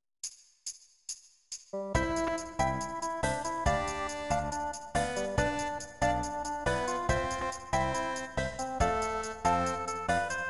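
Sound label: guitar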